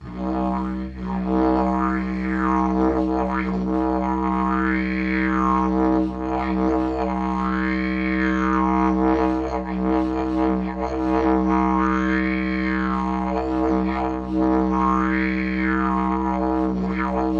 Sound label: Digiridoo